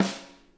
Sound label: SNARE, Interactive Drum Kits; Sonic Reality